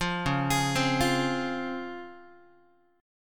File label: C sharp Major 7th C sharp Guitar; C#M7 arpeggiated; JGuitar